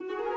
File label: Joyful Start 60bpm; Music Dialogue